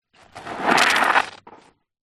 foam bubbles squeeze squelch hand; foley

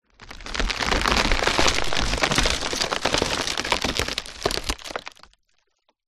impacts wood tree fall just wood splintering

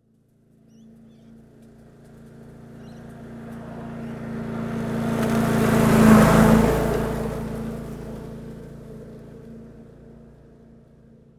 household lawnmower petrol 4 stroke pass by

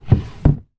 household wardrobe drawer old heavy open; zapsplat

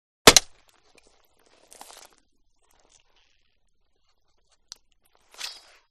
Sword Stab Through Ar; Audio Hero

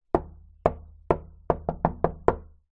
household window knock single pane

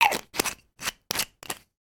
foley wine bottle lid screw on; sound effects one

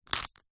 food pepper mill plastic turn grind single; zapsplat

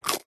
catoon bite munch single

